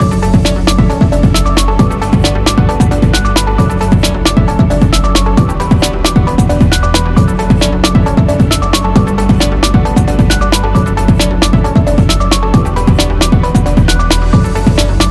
Background Abstract Loop; service business

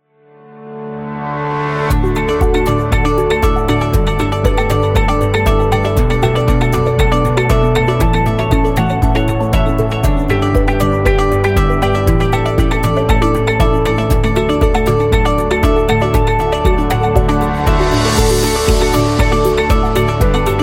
Happy Instrumental

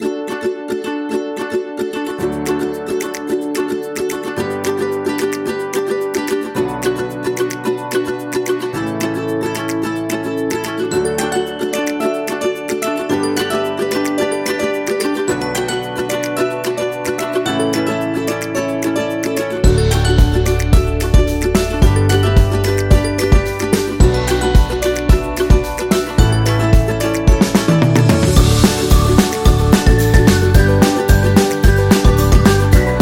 Cute

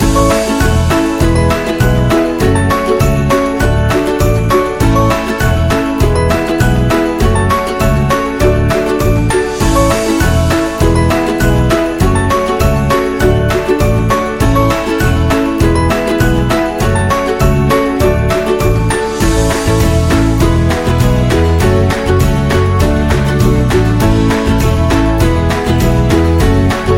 Happy Loop

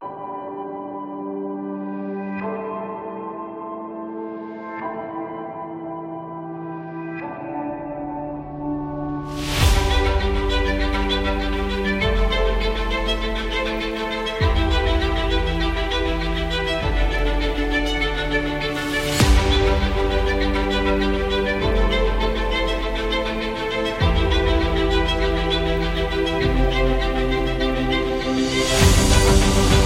Corporate Epic

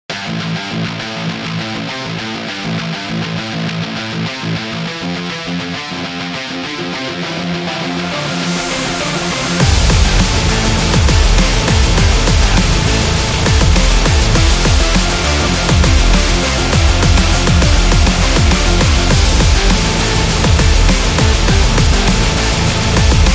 Dubstep Rocking

